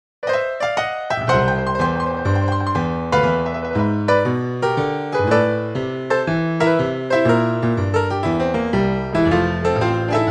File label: Ragtime